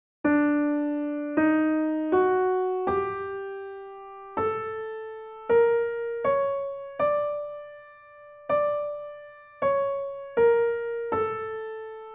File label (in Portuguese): escala persa temperada